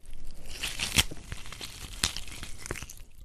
tearing into flesh

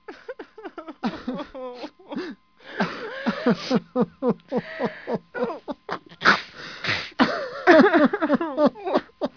people

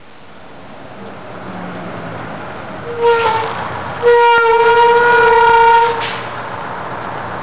vehicle

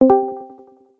discord desconectado

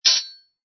METAL DROP

